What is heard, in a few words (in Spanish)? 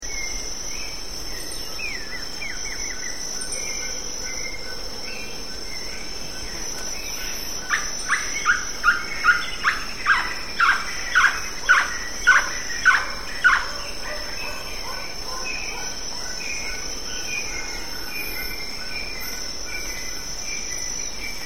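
Caburé Chico, Glaucidium brasilianum, Ferriginus Pygmy-Owl; cantos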